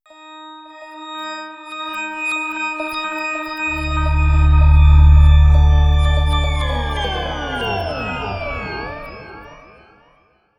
Round End

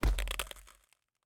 ice land

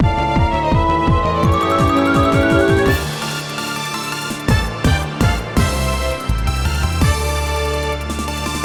Battle Researcher Intro

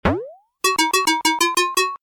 BGM 1UP

